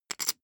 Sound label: poker chip stack small rattle